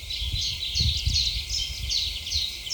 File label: P collybita